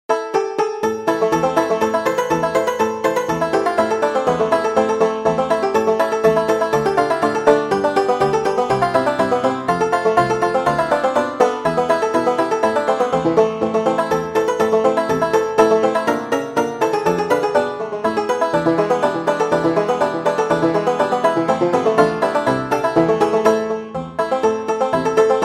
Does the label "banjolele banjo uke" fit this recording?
Yes